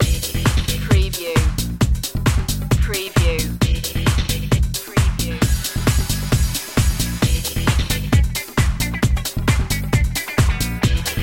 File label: tribal stomp